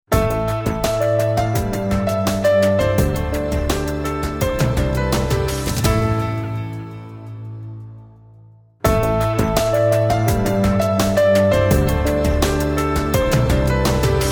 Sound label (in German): Vari MU Kompressor